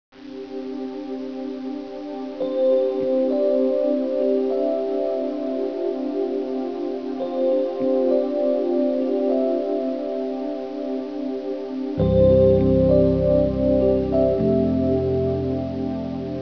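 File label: music bells; The Search for Ancient Wisdom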